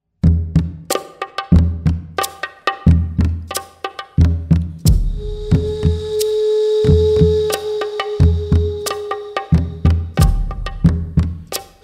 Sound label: reggae